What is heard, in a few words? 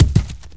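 body fall heavy; The Elder Scrolls III - Morrowind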